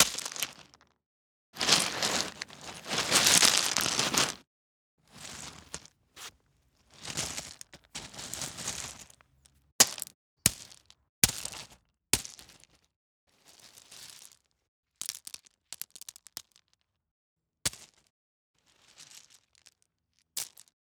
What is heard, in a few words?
Stone Rock Mono Falling